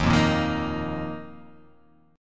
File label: D sharp Suspended 2nd